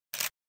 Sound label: camera click